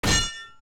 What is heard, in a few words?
Gate; Phantom Ganon; zelda; OOT